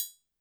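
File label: Triangle Open Short, YAMAHA RM1X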